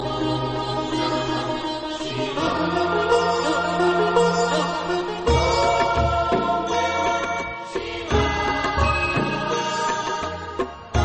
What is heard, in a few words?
om namah shivay om namah shivay with dhol beats